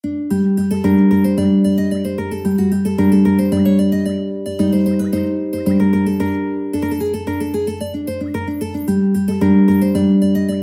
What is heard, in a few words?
mandolin